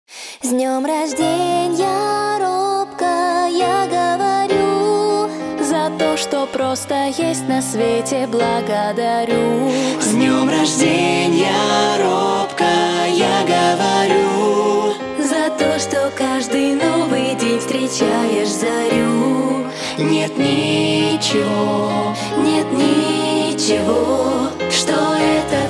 rus cover by 6 people

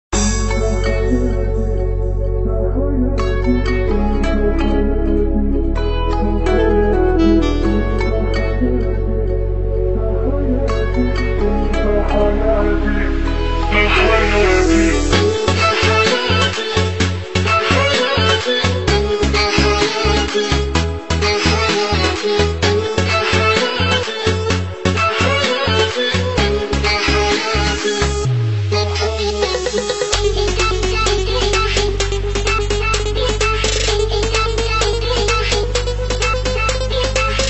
arabic remix

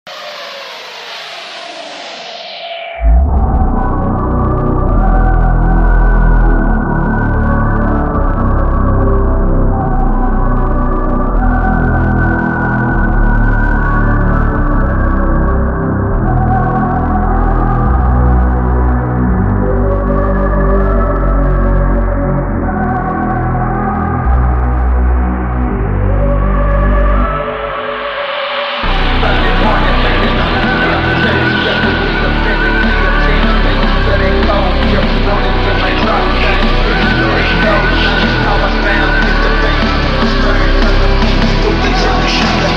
phonk remix

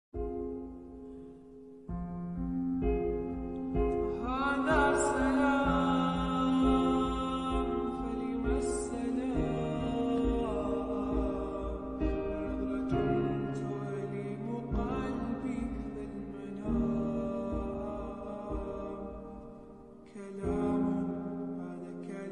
slowed reverb, Islam Nasheed; Gaza

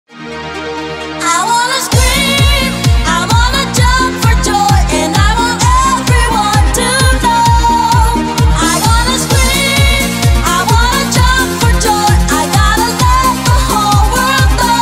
shuffle dance remix; sn studio

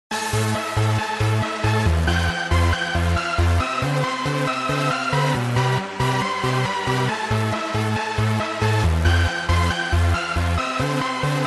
slowed reverb

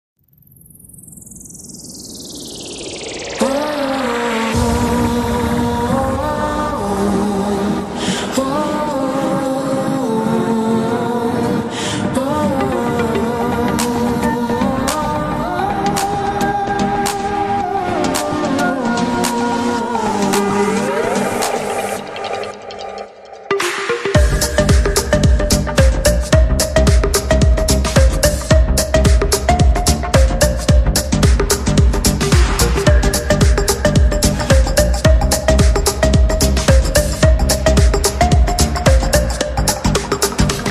slowed reverb remix

